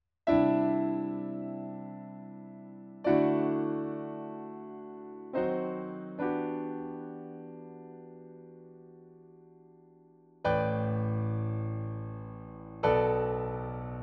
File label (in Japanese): ピアノ; 草原; ⼩平市⽴ ⼩平第四中学校 2年 B2 課外部門 歌曲以外; 第56回 東京都中学校 音楽創作コンクール